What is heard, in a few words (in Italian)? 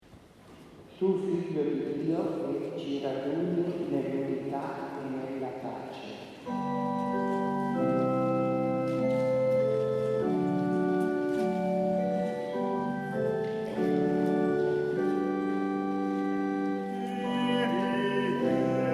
Kyrie Eleison, Coro